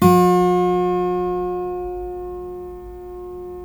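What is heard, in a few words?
GUILD 12-string, Guitars; Spectrasonics; Hans Zimmer Guitars VOL-1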